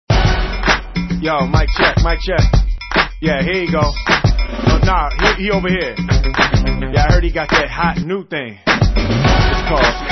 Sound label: rnb rmx